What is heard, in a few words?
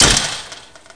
GATE; PC Gamer 4.2; mtm2demo